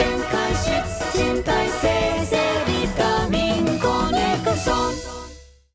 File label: gameplay interstitial promo